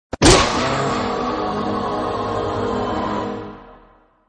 Defeat Game Over; Adventure Time Finn Bones Online

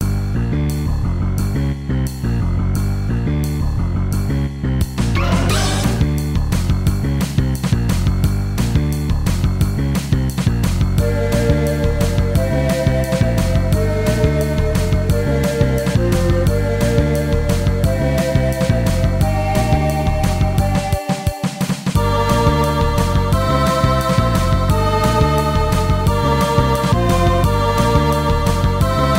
stinger

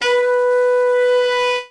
Sax tone; lego my world first steps

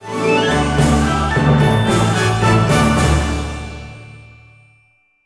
Fireworks Music Gold Chest

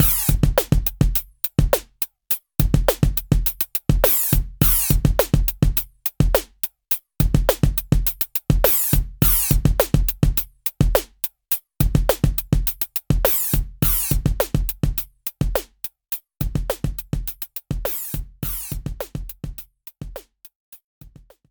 Loading

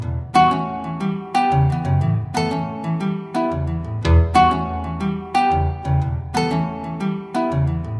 Guitar Loop; Camp

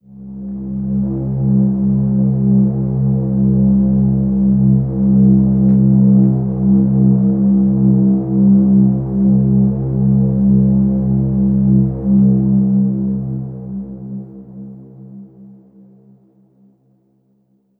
Downtown Overlay Drone

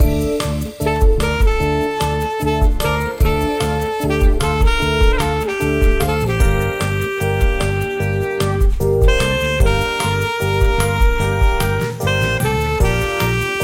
Dreamy Delights (Speed Up); Cooking Dash

